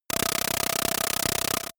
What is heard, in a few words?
computer sound, signale; soundpool